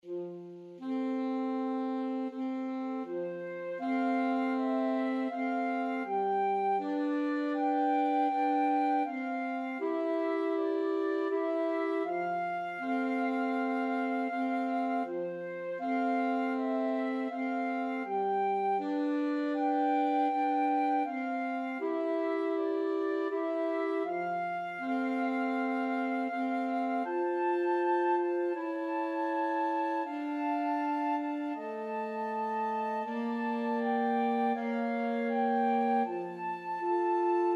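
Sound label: aura lea, string quartet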